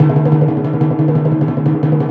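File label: TIMPANI ROLL; WS#8 ORCHSTR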